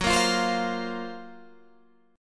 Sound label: F sharp Major 7th Flat 5th F sharp, Guitar Open G